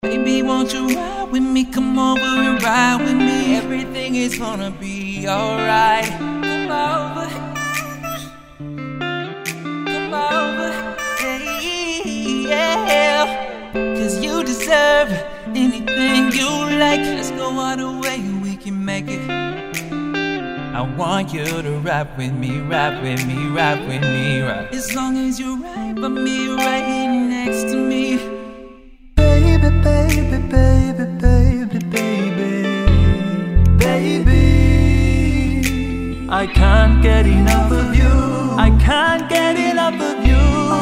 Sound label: Male Pop Vocals